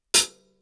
H-H tranche ferme; cymbales

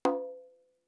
Congas bord

